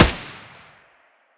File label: scar muzzle mono distant